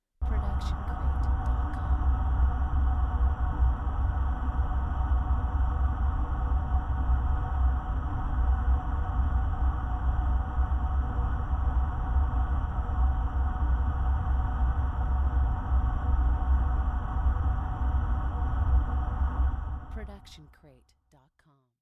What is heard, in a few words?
Distorted Haunting Voices; soundscrate